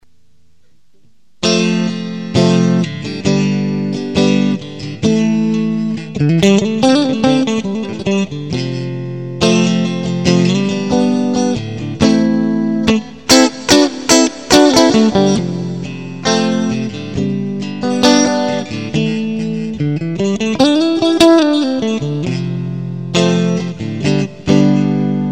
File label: Hybrid Neck Mid Clean, guitar pickups